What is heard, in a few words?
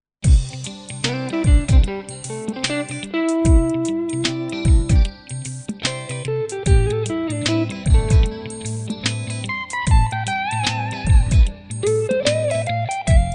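guitar solo, Giro armonico; Improvvisazione; Giro armonico Improvvisazione #1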